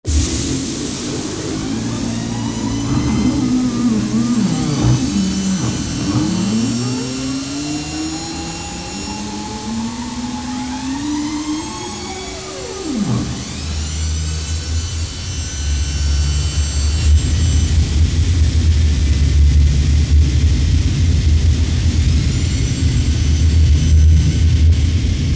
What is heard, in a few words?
millenium falcon startup